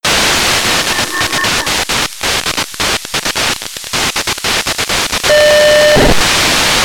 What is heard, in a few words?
cw and msk signal fm demod wide; dtusat-2